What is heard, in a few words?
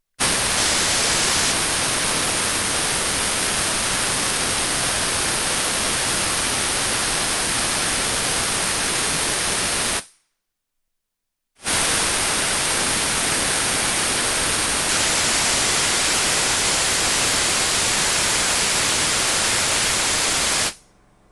compressed air blower on run air release sustained off; fvtt sonniss gdc audio